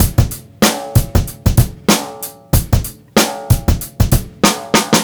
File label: beat, Roots Funk; USB Soundscan vol.41; AKAI